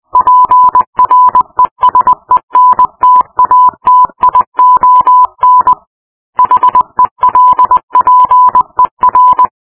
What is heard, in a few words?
morse